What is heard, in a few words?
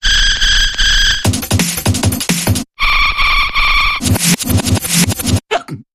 Hic Town Dance Bumper Reversed